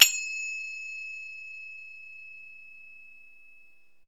CYMBAL, PERCUSSION; AMG - Vince Clarke Lucky Bastard

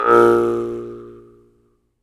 jaw harp, Pitched Percussn, Jaw Harps; E-MU Producer Series Vol. 7 – Old World Instruments

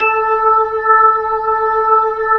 B-3, Jazz

